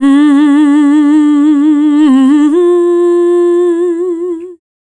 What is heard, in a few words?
Dance Vocals, FEMALE